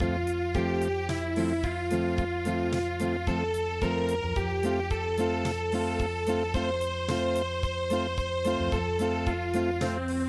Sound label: Unplugged, midi